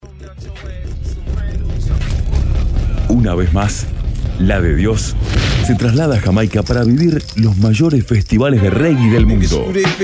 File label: Reggae